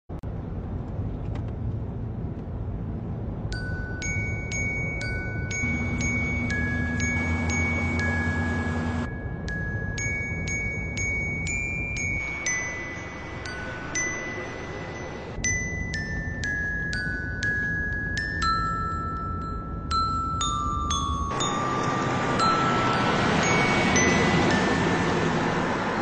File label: Xylophone Song